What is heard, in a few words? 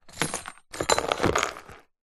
cardboard box small containing metal screws and other pieces movement tilt; zapsplat